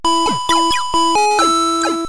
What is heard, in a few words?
Heal Party; P3D-Legacy